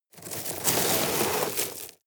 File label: sweep small rocks